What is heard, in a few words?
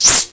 spark